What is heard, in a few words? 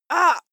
female fight moan pain short; schreie; menschen